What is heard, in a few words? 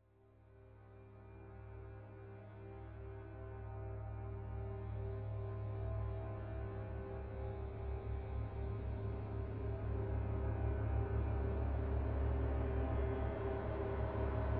Crescendo